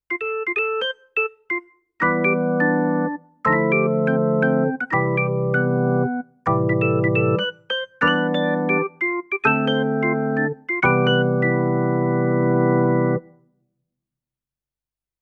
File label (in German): Orgel